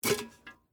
Teapot Pick Up, Household, Teapot, Pick, Up, Empty, Metal; Kitchen Sound Effects; SoundEffects-BlastWave